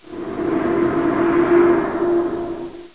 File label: creak